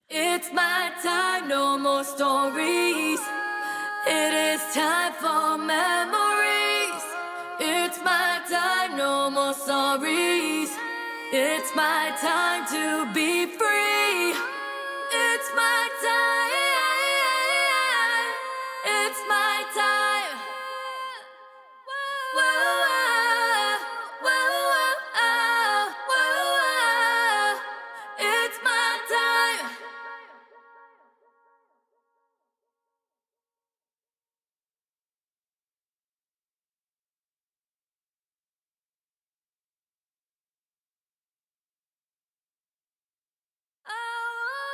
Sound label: free Vocal Acapella; 128.0 BPM